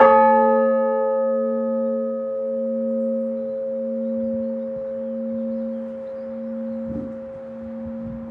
cowfold, bells

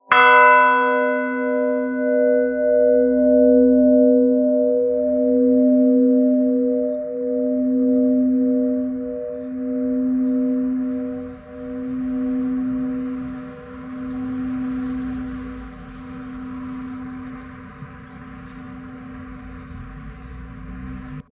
lower beeding old six, bells

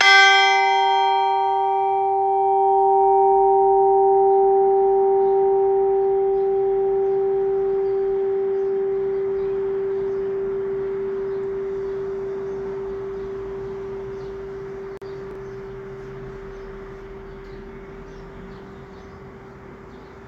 lower beeding one, bells

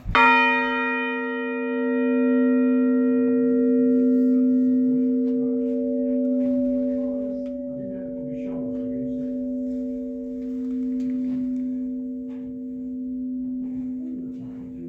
horsham, bells

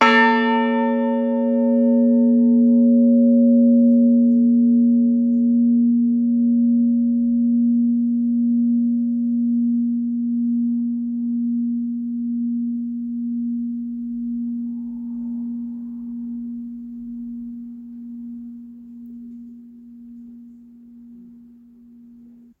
stoughton tenor, bells of sussex

